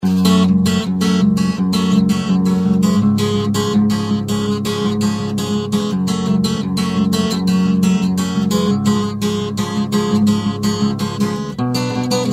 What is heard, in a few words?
guitar